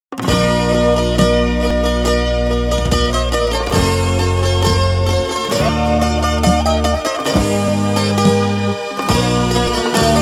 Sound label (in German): Die Bouzouki; Ihr Lied